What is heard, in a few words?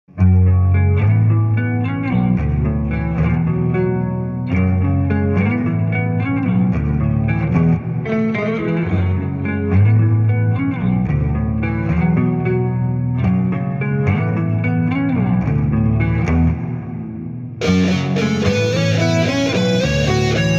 instrumental